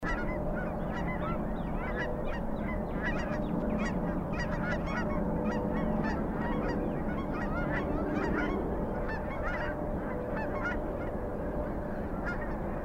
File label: Goose Takeoff, Canada Goose; Ducks